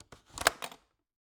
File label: Cabin, window, latch, flip, lock, stuck, release, open, hyper; Eiravaein Works; Vaeyan IV